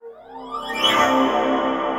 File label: sweep; radio susan